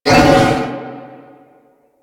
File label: Wolf Attack; Animals; DE; Warframe; Quests; Duviri